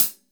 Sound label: MIX CLHH, STUDIO ST HH